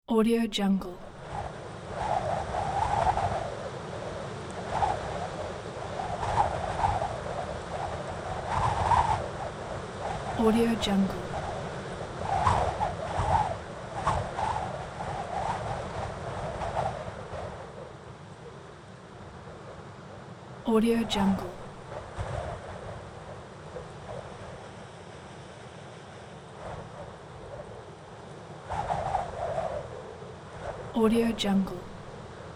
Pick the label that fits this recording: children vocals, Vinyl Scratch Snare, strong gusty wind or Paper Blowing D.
strong gusty wind